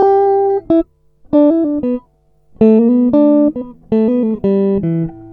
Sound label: Guitar loop 90bpm Em; guitar vibes